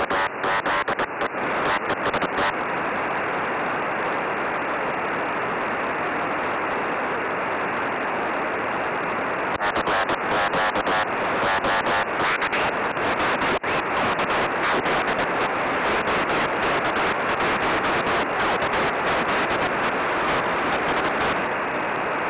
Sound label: qrm; ok1kze